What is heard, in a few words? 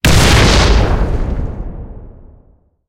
tanks explosion; explosions; War Thunder